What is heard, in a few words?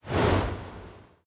gambler enter; Butterfly Lovers; wazdan; ultrafast